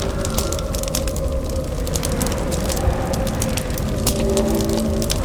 green fire

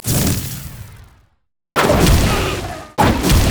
incinerate; LoL; annie base

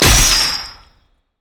shackle hook; LoL; thresh

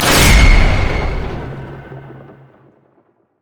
jail wall; LoL; thresh

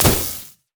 bandage toss; LoL; badrobotamumu

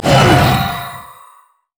minotaur triumphant roar; LoL; alistar

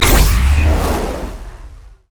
shock blast wall; LoL; jayce

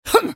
effort; Nidalee; Character Voices - English

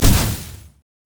sonic wave; LoL; leesin; blindmonk

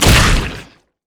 basic attack; Zac

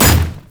rumble carpet bomb

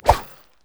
minotaur basicatk; LoL; alistar

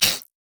voidling effort; LoL; alzahar

